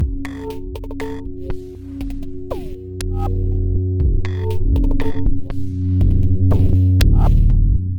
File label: Ambient Beat